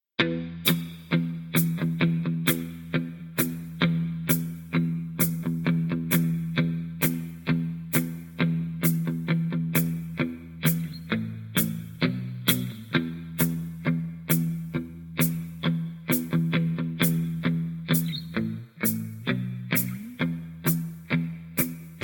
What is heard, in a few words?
E No Backing Vocals, backing tracks